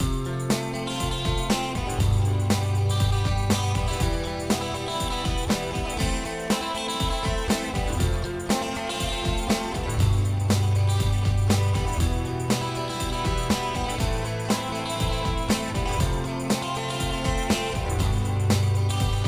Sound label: arpeggio acoustic; Hevenly